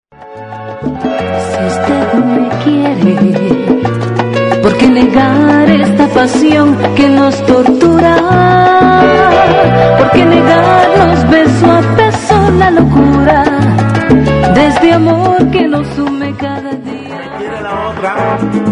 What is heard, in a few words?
salsa Latin